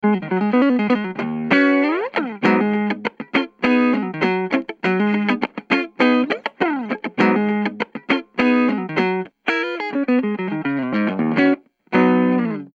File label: Micro Terror PPC108 Ibanez Challenger